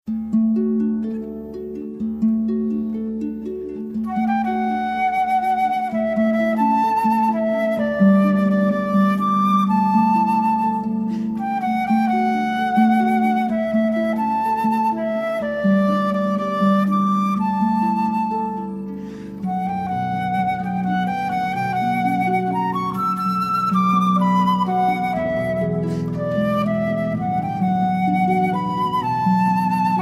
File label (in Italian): arpa flauto